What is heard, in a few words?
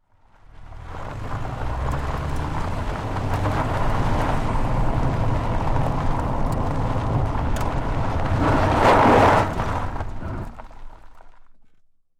Car Tire Onboard Gravel Dirt Roll Fast Skid; BigRoomSound